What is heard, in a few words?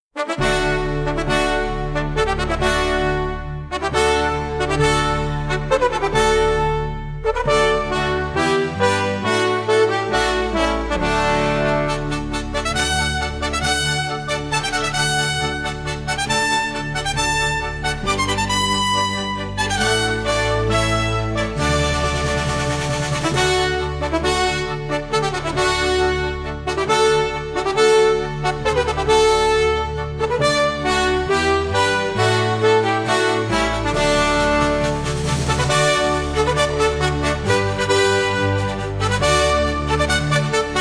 Fanfare; tanzsport